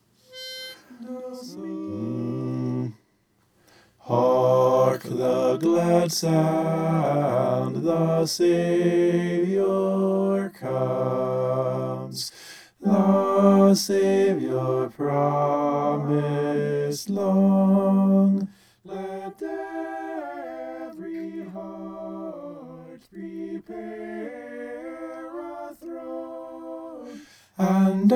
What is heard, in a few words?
bass